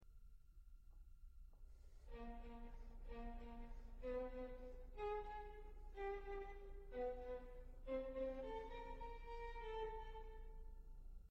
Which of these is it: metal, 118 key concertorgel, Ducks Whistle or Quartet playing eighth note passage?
Quartet playing eighth note passage